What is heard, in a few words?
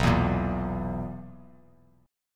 B Major 7th Suspended 4th B down; BM7sus4; strummed